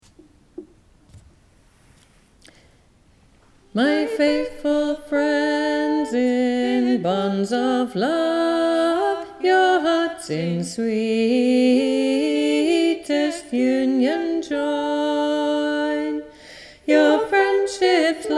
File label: Thunderbird BASS